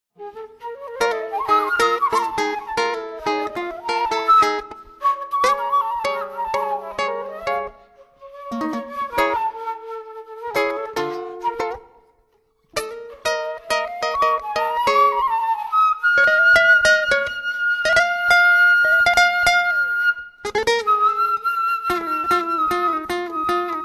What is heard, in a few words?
Ume FLUTE SKETCHES